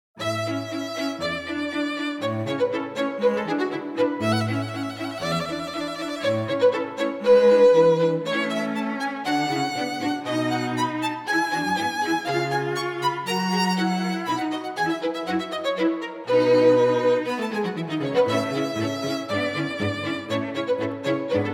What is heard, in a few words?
Violin Quartet